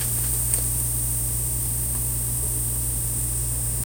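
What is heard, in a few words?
shaker rattling birds chirping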